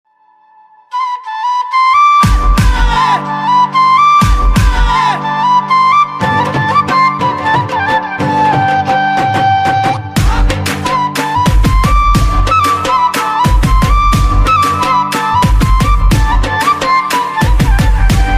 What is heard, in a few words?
festival flute ringtone